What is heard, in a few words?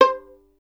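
STR violin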